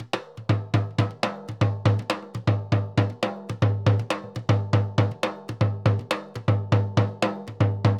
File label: Surdo Samba 120, Drums & Percussion; 120 bpm; Best Service; Festa Latina VOL-1